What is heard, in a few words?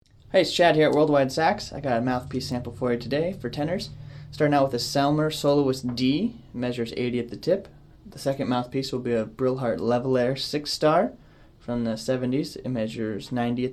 TENOR; SoloistD, LevelAir6star